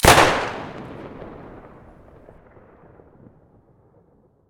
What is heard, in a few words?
saiga muzzle stereo, weapons